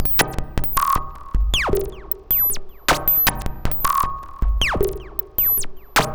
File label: Mod Loop, Modular Percussion; Sonic Foundry (Sony Creative Software); Synchro Funk